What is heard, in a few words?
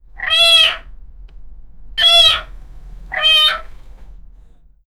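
farm animal noise, baa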